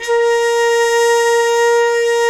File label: mute flute, hybrid winds